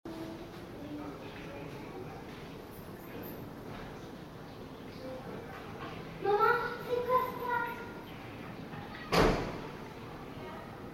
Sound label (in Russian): zvuk v podezde